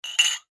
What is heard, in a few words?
household glasses tumblers x2 clink; Drinking Glasses (X2) Clink Together; Clinks (Sound Effects:Objects); McKinney Sound